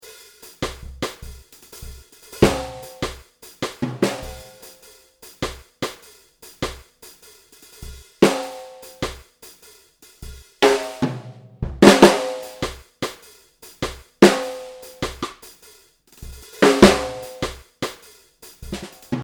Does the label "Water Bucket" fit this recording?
No